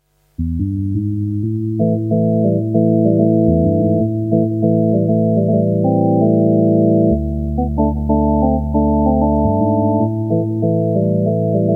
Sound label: RS370, Polyphonic harmonic generator